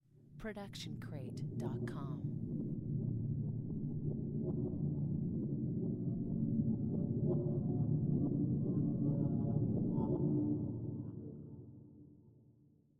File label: scifi rumble